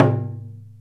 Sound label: TAIKO; Crypton - DrumBase5000; JAPANESE PER